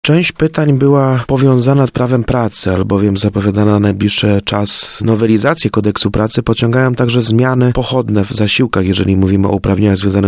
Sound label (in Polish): chor; Tarnobrzeg. Eksperci z ZUS w Radiu Leliwa.; Radio Leliwa